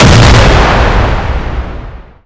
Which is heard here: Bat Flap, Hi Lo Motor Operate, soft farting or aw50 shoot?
aw50 shoot